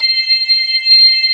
B3, Drawbars

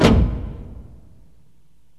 STACKED PERC

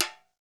REPIQUE; World Combo

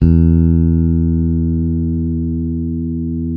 bass, sustain; Sampleheads; Will Lee Bass Library

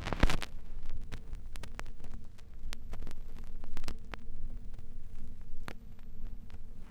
Crackle, Vinyl Noise; noise hiss crackle

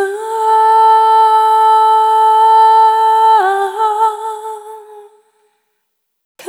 Single Voice Melodic Loops, Vocal Samples; Pocket Diva World Class Vocal Samples

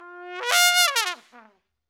TRUMPE, Trumpet FX; Roland - LA Composer vol. 2

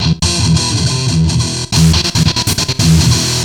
Treat Guit, Industrial Nasty

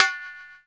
TIN PERC; Zero-G; Funky Elements

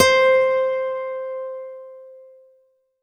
C4, CLUB ORGAN; Roland JV 2080

